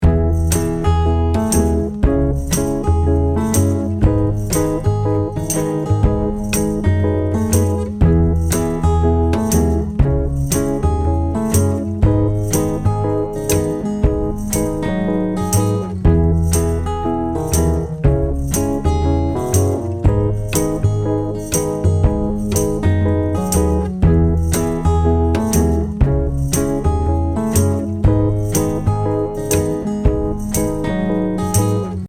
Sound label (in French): MUSIC SONNERIE PORTABLE; SFR